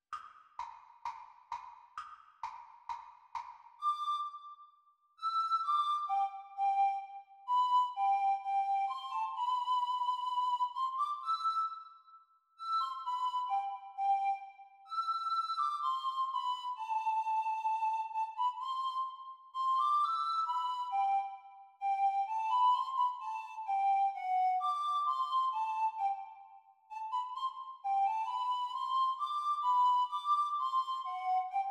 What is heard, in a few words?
Descant Recorder Performance speed; Grade 3